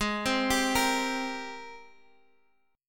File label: Ab Major 9th Ab, Guitar Open G; down